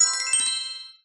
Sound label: tool Unlock kira